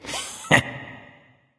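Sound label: "heh heh", nonverbal; smite; khepri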